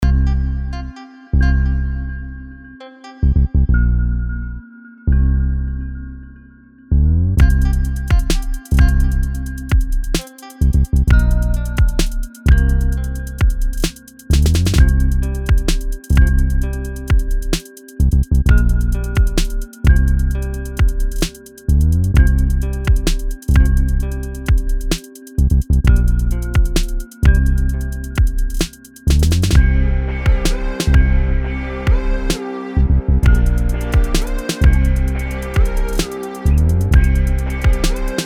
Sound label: Hop (instr.)